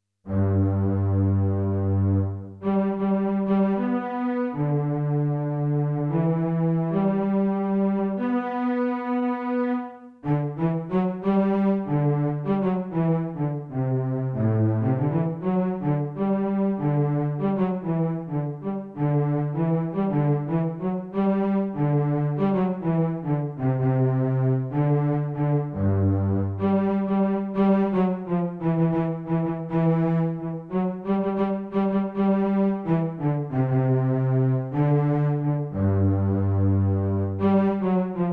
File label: down by the river side basse